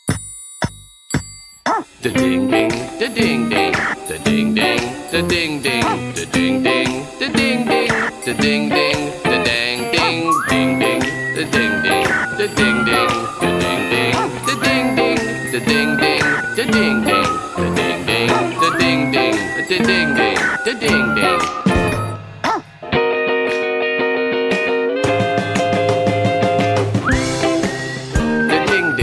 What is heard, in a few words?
Da ding ding